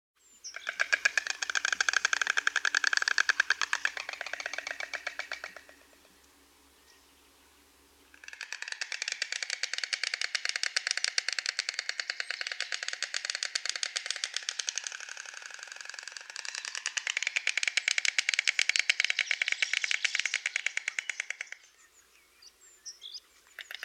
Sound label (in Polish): Bocian bialy